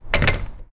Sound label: metal